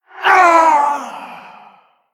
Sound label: Female Elemental Death